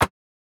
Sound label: Household Perc